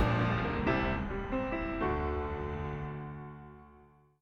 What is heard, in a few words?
chime